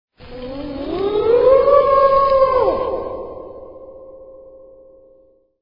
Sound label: ghost